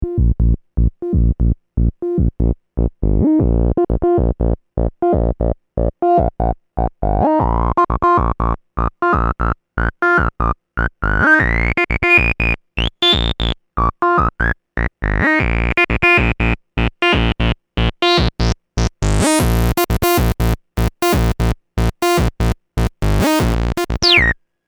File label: Dark Energy Acid Style